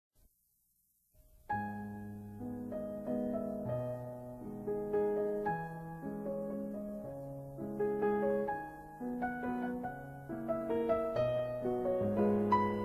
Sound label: Waltz; Brahms: Waltz No. 3